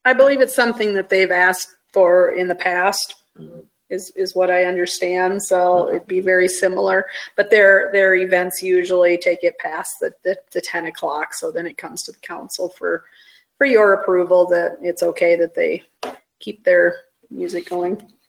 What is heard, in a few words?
Warrior Noise